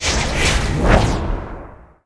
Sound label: dark blader attack, monster